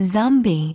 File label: zombie